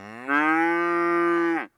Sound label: cow moo